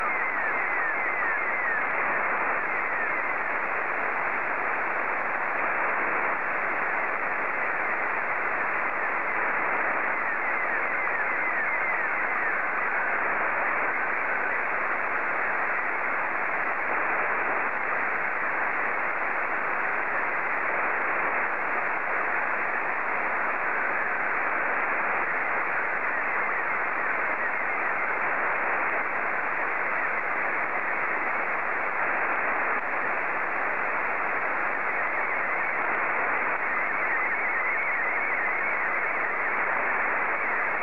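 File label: SSTV, Scottie 2; JA3OEN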